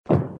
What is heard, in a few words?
jump wall